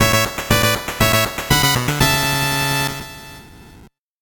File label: 8bit jingle slow